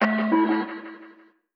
doop

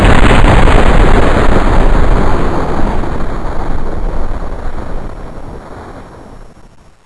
explode

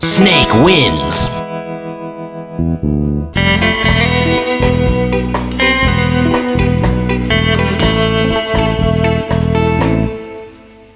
win; mgs

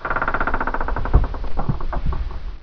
push box; zm huebunker

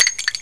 shell

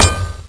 gren bounce

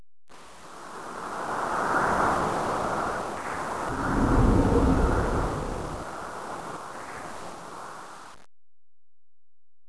wind ambient

